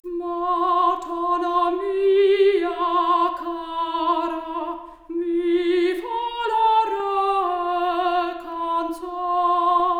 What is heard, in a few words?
Soprano Stereo output noised, 3bit